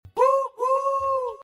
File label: whoo whoo